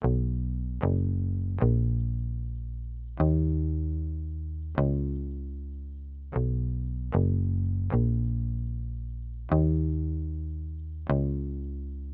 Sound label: 152 BPM D# Min Bass Guitar; Loop Stems; Cymatics; Mosaic